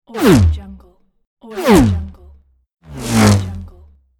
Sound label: fast whoosh